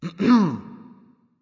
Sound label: clearthroat; erlangshen